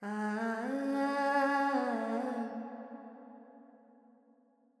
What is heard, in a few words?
Verb Phrygian, Vocals, Carnatic Female, Alaps; Sample Banks